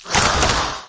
evade left, maggot; doom3

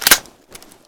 charge for, weapons f2000; fokku tc f2000

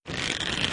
pull; bow

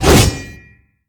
attack

